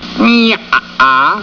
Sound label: dishonest laugh